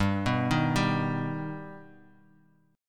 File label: G Augmented, Bass Standard; G+ arpeggiated; JGuitar